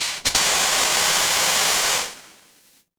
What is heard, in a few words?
fire electric fuse